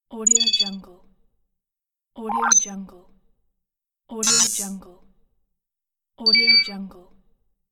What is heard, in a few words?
futuristic hud sci fi